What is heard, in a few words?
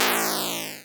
Fail